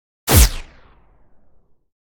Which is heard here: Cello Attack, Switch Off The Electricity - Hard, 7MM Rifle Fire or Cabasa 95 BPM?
Switch Off The Electricity - Hard